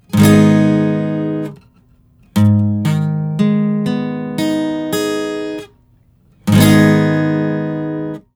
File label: Major or Minor